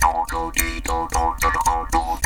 Jaw Grooves, Didgeridoo and Other Primitive Instruments; Big Fish Audio - Didgeridoo and Other Primitive Instruments